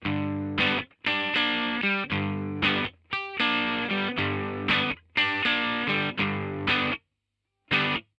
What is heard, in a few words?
crunchy